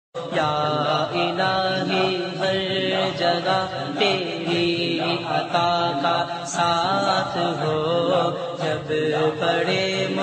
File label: ya elaahi ringtone